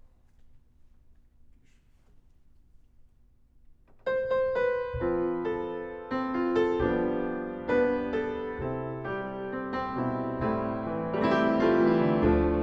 piano low

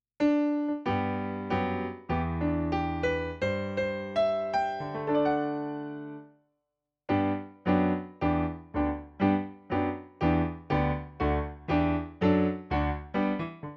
Piano

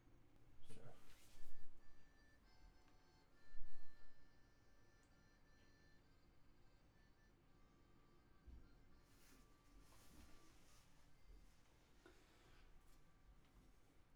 vocal add condens

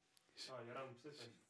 bass

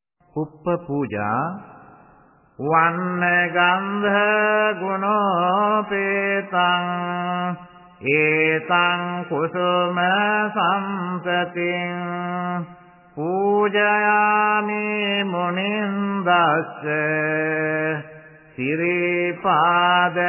chant; Buddhism

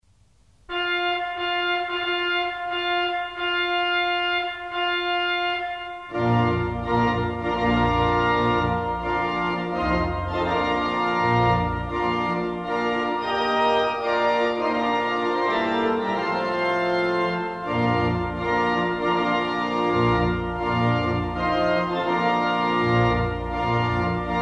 Wedding March Marcha nupcial; Vivaldi

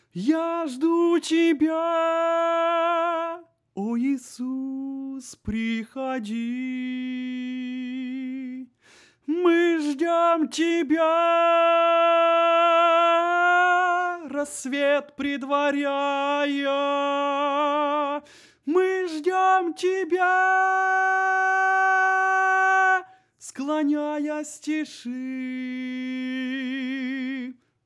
tenor

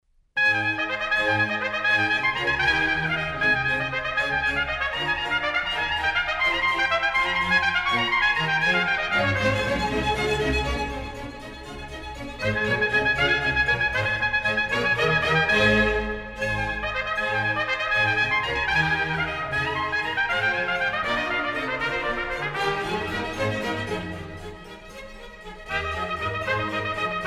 Trumpet Concerto in Ab Presto; Antonio Vivaldi; André&Karajan

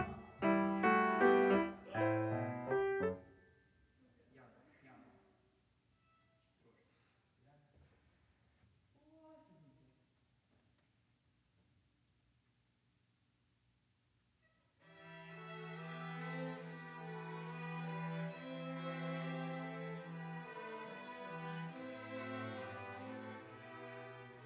Piano

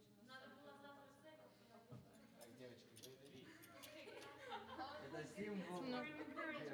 Vocal

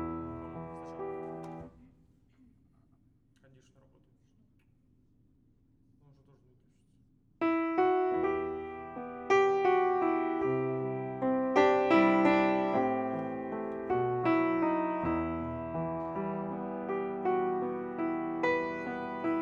piano

